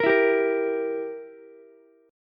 E Diminished E, Ukulele; Edim strummed; JGuitar

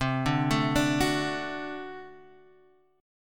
D 7th, C

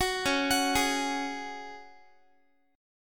Db Suspended 4th Db, Guitar; arpeggiated; 21,21,21,18,x,x

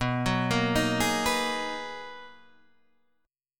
B Major 13th B, Guitar, Standard; BM13; arpeggiated; JGuitar

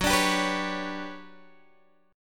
G Major Sharp 11th F sharp, chord

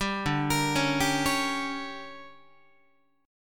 D Major 7th Suspended 4th Sharp 5th, Guitar; DM7sus4#5; arpeggiated; JGuitar

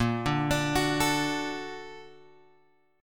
A sharp Augmented Major 7th A sharp, chord; A#+M7 Chord {6 5 X 7 7 5} arpeggiated

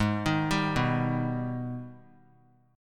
Ab Minor Major 7th, down; arpeggiated; JGuitar

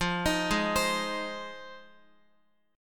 F Minor 7th F Guitar; arpeggiated; JGuitar

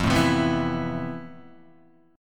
F Major Sharp 11th, Guitar; strummed; JGuitar

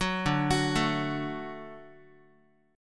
D Major D down Guitar

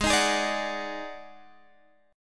D sharp Minor Major 7th Double Flat 5th G sharp

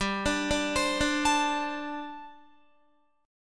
G Suspended 2nd Suspended 4th, Guitar; Gsus2sus4 arpeggiated; 14,17,14,17,14,17

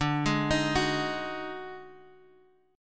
Eb Minor Major 7th Double Flat 5th

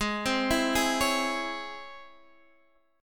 Db Minor Major 7th Ab

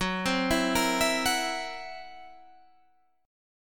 Gb 7th Flat 5th Gb Guitar Half Step Down

